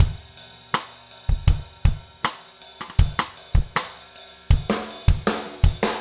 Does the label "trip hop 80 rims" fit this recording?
Yes